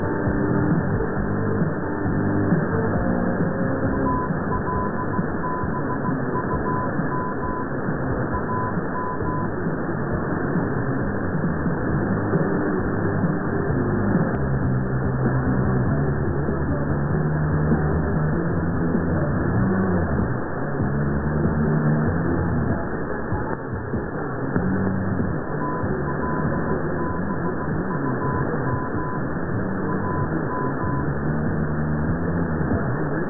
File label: AM Uden 1251 CW ID; netherlands